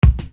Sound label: Bass Dr, EuroDance Bdrums